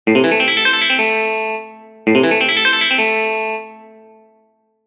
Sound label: sweep